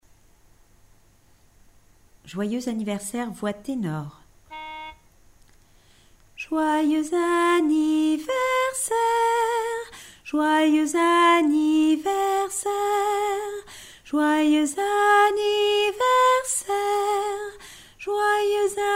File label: happy birthday tenor